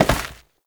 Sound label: Step Dirt, Foley; Warframe; Oraxia